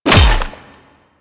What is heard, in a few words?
Melee Hammer Impact Flesh; Warframe; Weapons; Hammers